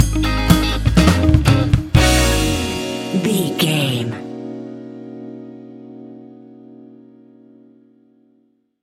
midnight mirage disco stinger, A fast paced piece of electronic dance music